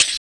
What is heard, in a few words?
RSS, 160 BPM